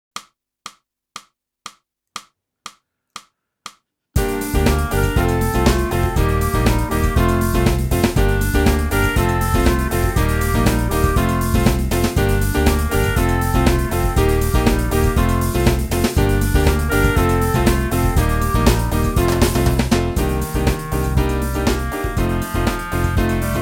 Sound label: clarinet; boosey method